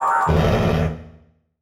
Title Transition Evolver Short; Adobe; Production Element

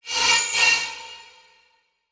Transition Whoosh Multiple; Production Element; Title Transition; AdobeCC

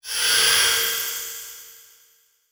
Transition Metallic Swell; Production Element; Title Transition; AdobeCC

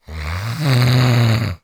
Human Male Sniffs Cold

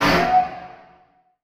Transition Hit Impact Metal; Adobe; Production Element

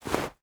Weather Snow Shoe Stepping On Snow; AdobeCC

